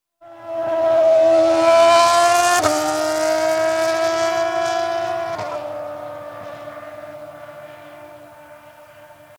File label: Mercedes W02 F1 Car Accelerating Slow Passing by Sound; Accelerating slow while passing by.